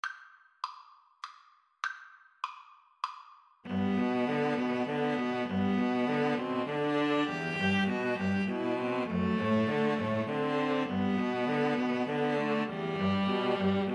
String trio

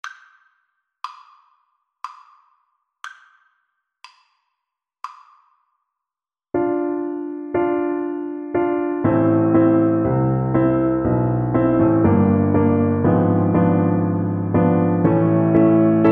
galliard sonata, Trombone

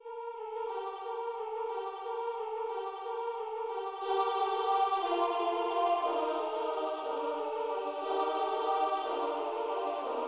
carol of the bells, choir

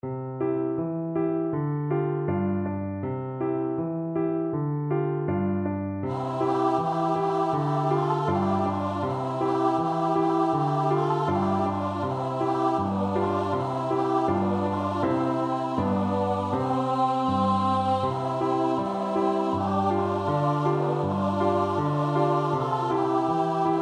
choir

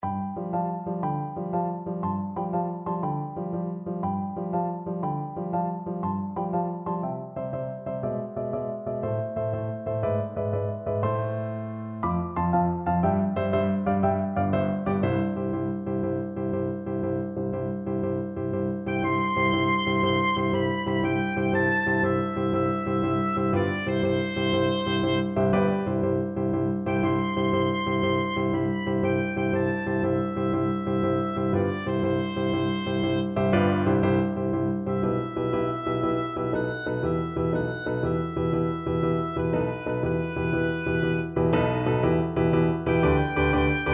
funiculi, oboe

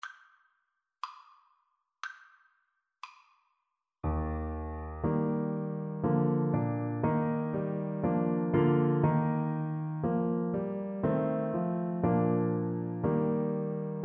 Clarinet

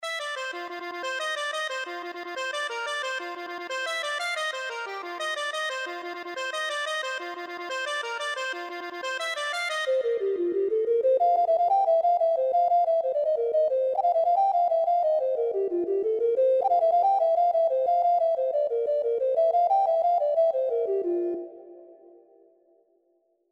accordion

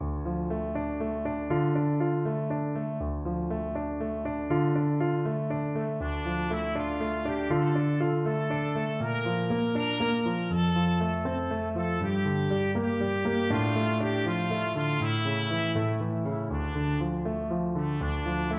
trumpet

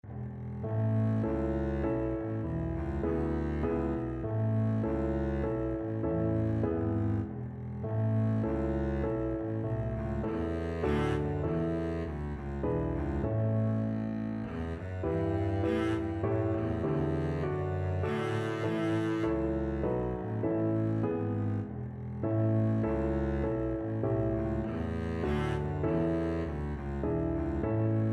double bass

